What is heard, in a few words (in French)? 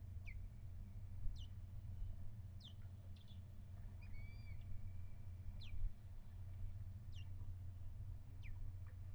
balbuzard; scandola 2018